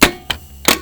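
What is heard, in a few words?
cassette tape eject; Sharp MZ-80B&2000; Neo Kobe emulator pack; EmuZ2000TF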